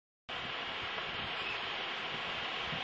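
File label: honey buzzard piped flight call; hexhamshire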